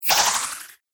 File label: Ghost Whoosh; Ghost Sound Effects; horror